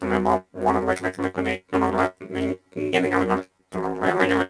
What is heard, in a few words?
bad garble, droids robots